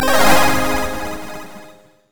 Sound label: crescendo; sona; paxsona